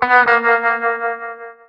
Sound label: Notification; Source